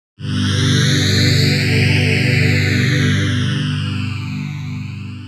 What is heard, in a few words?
Sola Pad; jup8; Aphelion